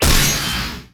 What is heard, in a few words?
Block